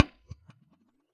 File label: Science 2x15 15PR400 SM57 Port, Bass Cab IRs